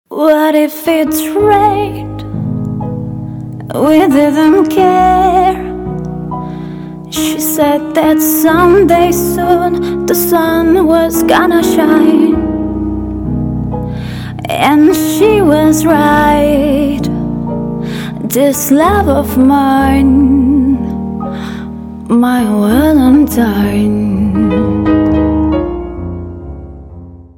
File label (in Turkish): vokal; valentine